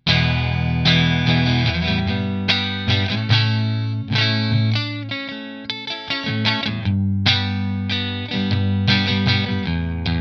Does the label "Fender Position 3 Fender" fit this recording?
Yes